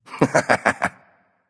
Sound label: laugh; smite; susano